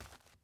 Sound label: dirt step; teardown; ps5 windows xbox series xs